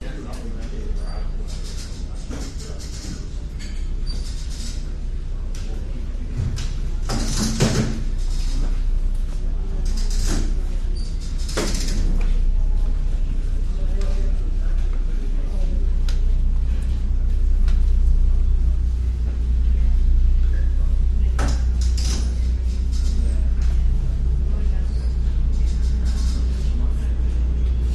seabus station walla steps walking people ambience thumping; vancouver; lonsdale quay; your free sounds